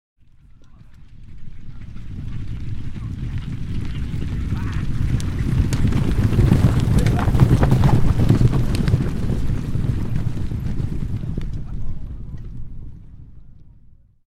150 Horses Charge By; Historical; Hollywood Edge Historical Series; War Horses Armies & Weapons

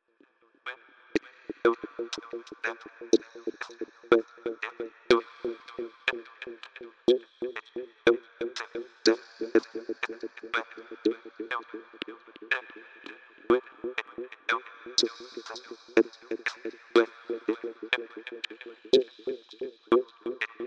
Vocal Sequence; Beds

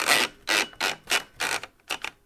typing, typewriter

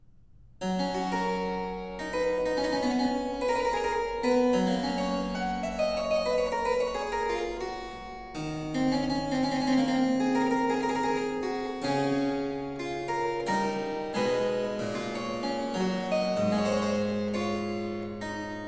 Praeludium in G, Keyboard; Pachelbel; German Keyboard Masters

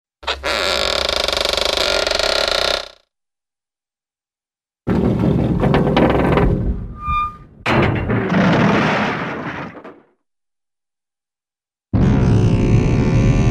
Slow Wood & Metallic Creaks; Hollywood Edge Cartoon Trax

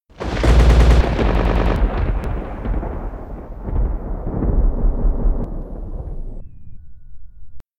impact

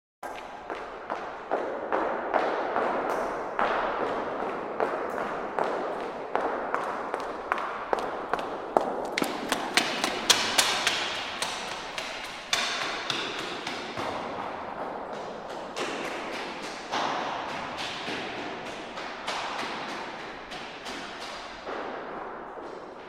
Footsteps By In Echoey Stairwe; Hollywood Edge Historical Series; Traffic Free BG's & FX

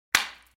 Juicy Impact; Fights Bodyfalls

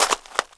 GRAVEL, FOOTSTEPS; 1000fx

